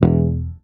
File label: MUT E1, Bass; HAMER12MUTES